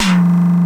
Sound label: PTX TOM; Crypton - DrumBase5000